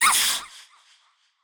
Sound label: Girl Pain K; Warframe; Zariman; Skitter Girl